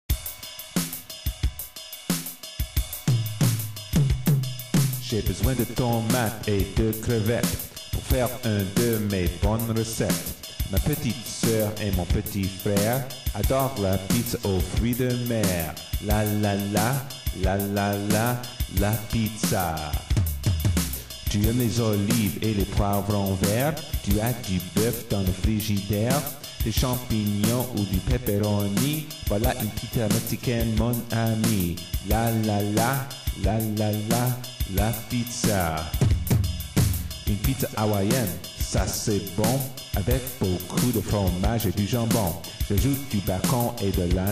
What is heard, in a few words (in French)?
j'adore la pizza rap